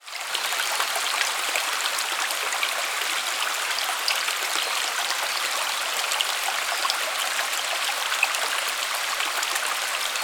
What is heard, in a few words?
nature creek forest shallow birds buderim forest qld australia